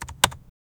keypad successful sound

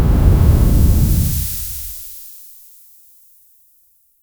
purple sleep wave from la

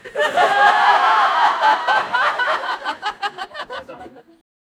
create the sound of an, audience

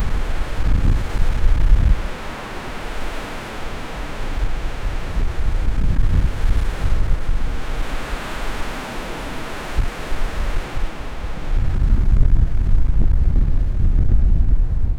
waves lapping seagulls, breeze

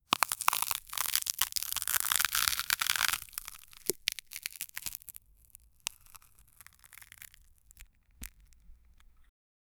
sounds of a crunchy spher

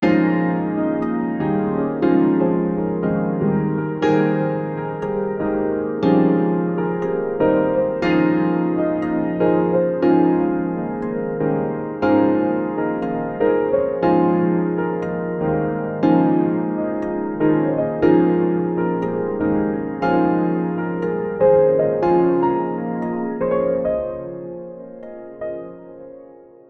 a warm uplifting tune wit

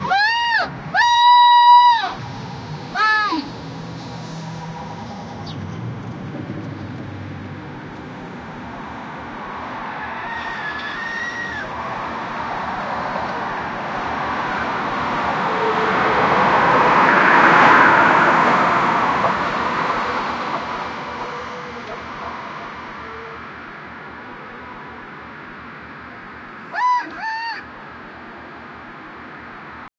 a car stopping very fast

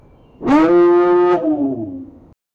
deep low call of the, deer

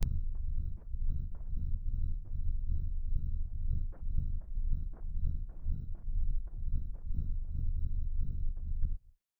sharp metallic clunk sub; submarine

